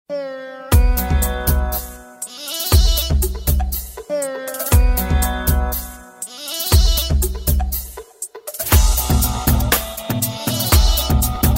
bollywood ringtone

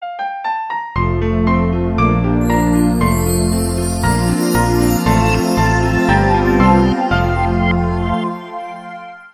sweet melodic piano ringtone

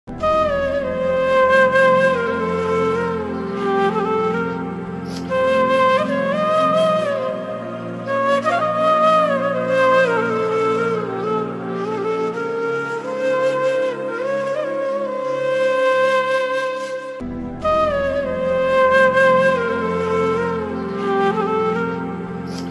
tragic sad flute ringtone

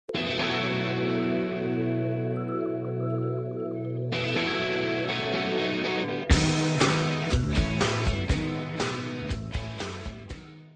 soft music tone

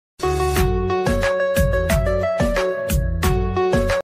funny ringtone

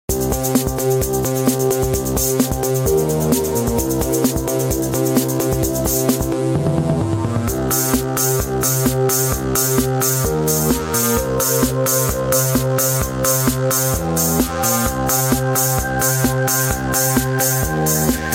galaxy s5 ring ringtone

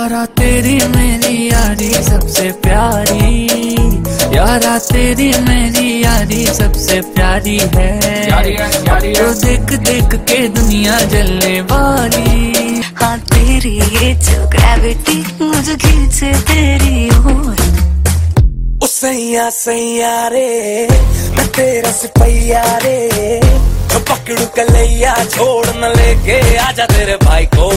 bollywood music ringtone

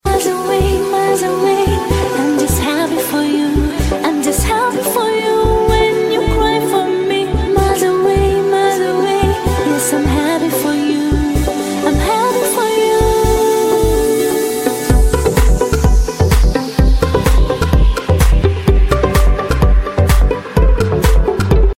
happy ringtone

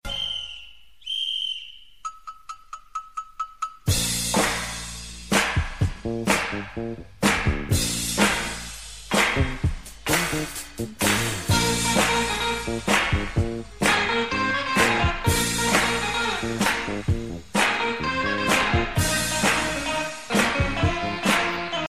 ringtone

